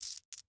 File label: Coins Moving In Hand; Foley